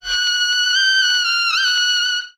horns, loops, phonky

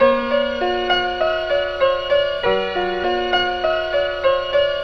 piano, sad

